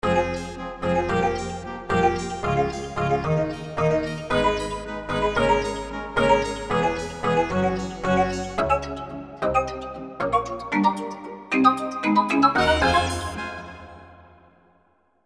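Phrase, An orchestra-like sound is imitated by using a combination of “sound fragment” loop waves, which are waves with various instrument sounds mixed together.; V-Synth; Ruined World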